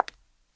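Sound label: short close ringing sound of heels stomping on wooden floor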